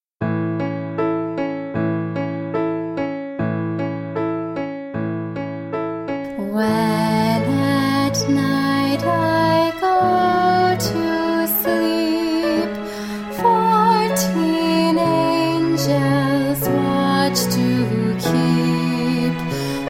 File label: evening prayer vocal